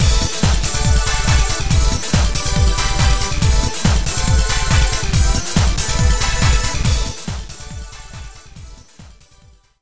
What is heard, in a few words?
music 4bit; 4bitPCM; 元は44kHz/16bitステレオ